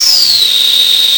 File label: missile fly; sonic